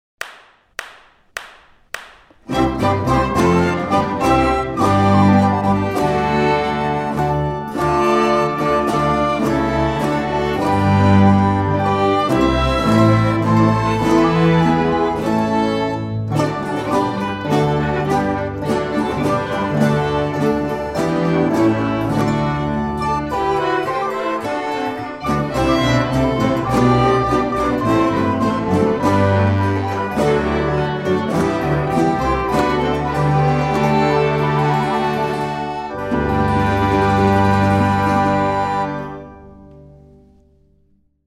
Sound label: orchestra